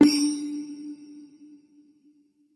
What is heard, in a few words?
multiplier And Freespins Left Count Up Loop; warlords